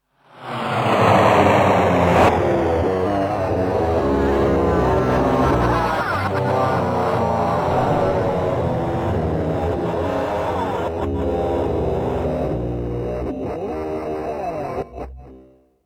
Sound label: descending backmasking